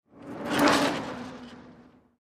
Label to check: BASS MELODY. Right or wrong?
Wrong